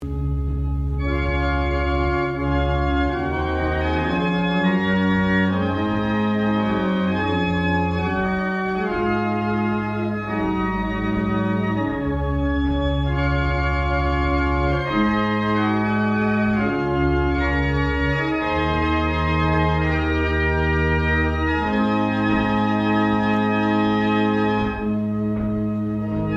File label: Windsor Chapel, barrel organs; Brightling